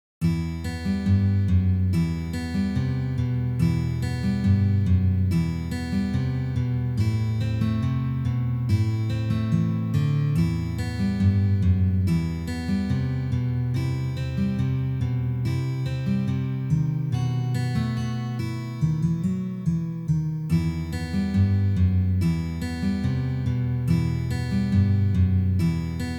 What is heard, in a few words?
Bass Run